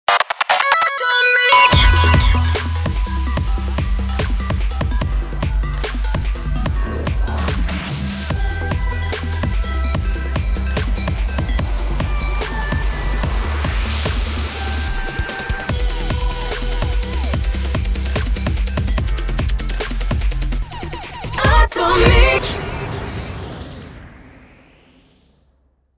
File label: Jingle; Atomic Cage